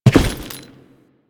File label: Step Default, Footsteps